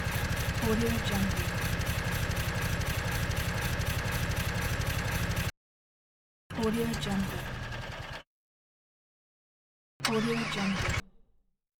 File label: scifi dieselpunk small machinery engine